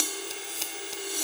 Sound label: Cymbol Particle; Particles